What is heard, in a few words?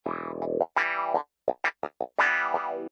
Wah Clav; Loopology; Get Dirty